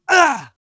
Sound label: minor pain, soviet